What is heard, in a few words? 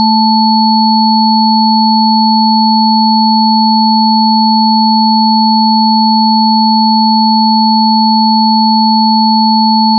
sum sin